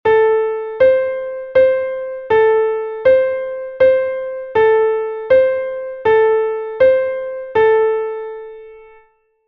seven minor third; Essentials of Music Theory: Elementary

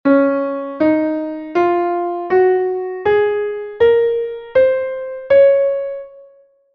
scale of c sharp; Essentials of Music Theory: Elementary

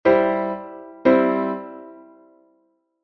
doubled fifth and fundamental; Essentials of Music Theory: Elementary

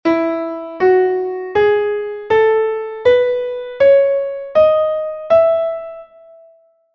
scale of e

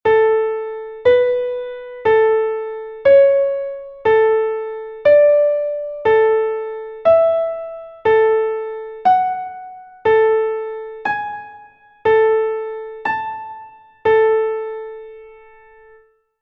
all intervals; Essentials of Music Theory: Elementary

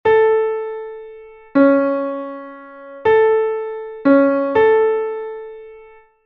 nine minor sixth; Essentials of Music Theory: Elementary

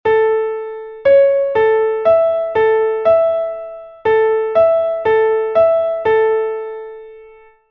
four perfect fifth; Essentials of Music Theory: Elementary

